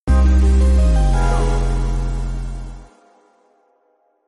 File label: Fx Win Rotten